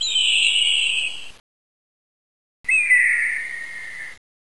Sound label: eagle